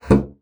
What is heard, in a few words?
bassoon slap; Xsample VOL-6; C BASSOON